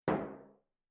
Metal Footfall; soundscrate

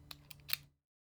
Revolver Hammer Down; SoundsCrate

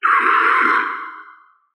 raptor roar medium; soundscrate

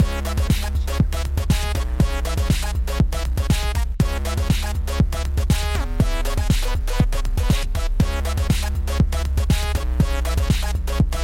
Logo Ident Retro Quirk; SoundsCrate

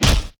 slap hit

